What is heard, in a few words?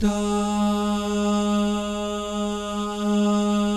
DAH, Vocal Jazz; ILIO